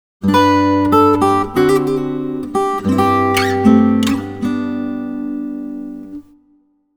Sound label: Save, solo guitar; Dragon Quest Solo Guitar Collections